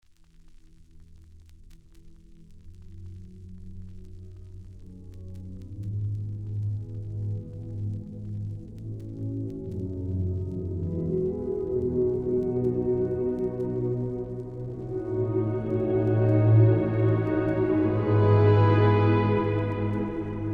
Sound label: binaural orchestronik